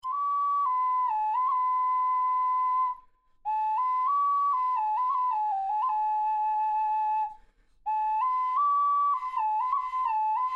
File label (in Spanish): flauta incensar; flauta incensario